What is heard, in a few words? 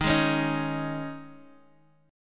Gsharp Suspended 4th; 11,11,11,8,x,x